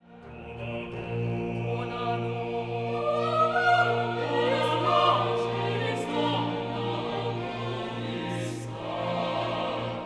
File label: Agnus Dei Drone end